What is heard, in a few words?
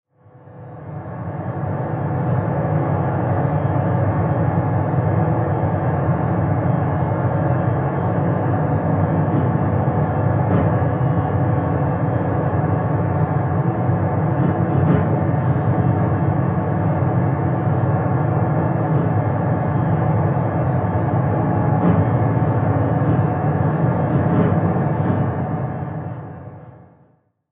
Drone (A), Trailer; Federico Soler Fernandez; Effective Trailer Drones